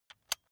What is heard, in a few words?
electric button drill direction switch forward soft